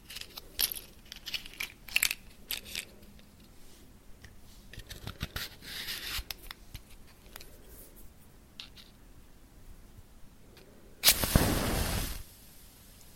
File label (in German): geräusch